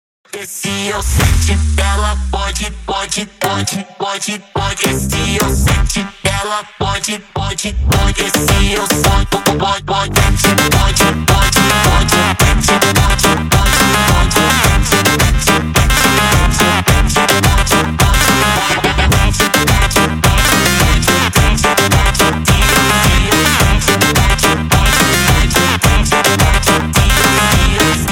Montagem sped Up

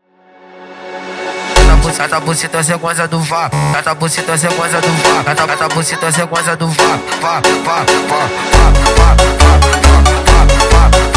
Montagem sped Up

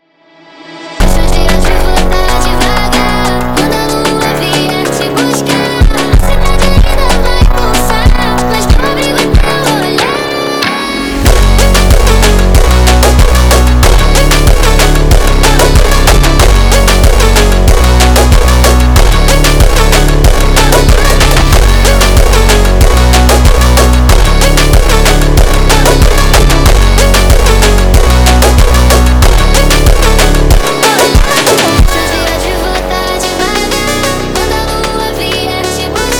Funk slowed

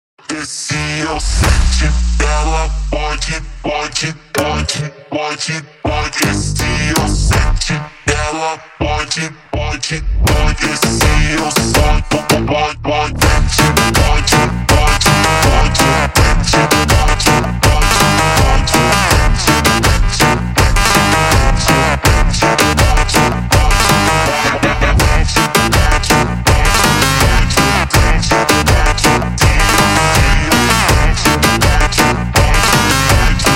Montagem ultra Slowed

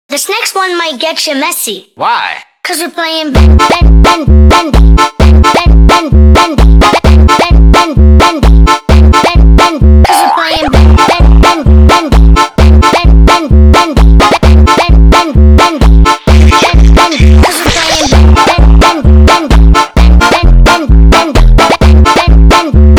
Bendy Funk

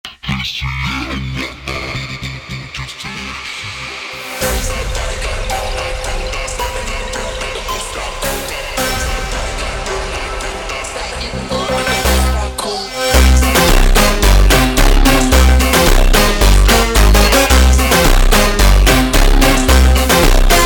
Montagem Slowed

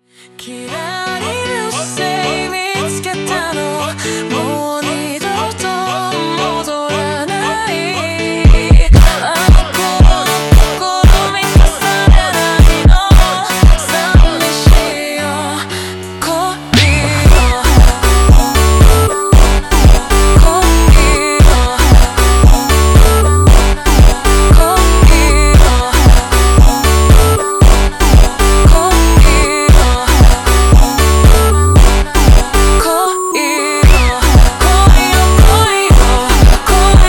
Montagem slowed

